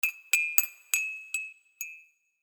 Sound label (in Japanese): ガラス風鈴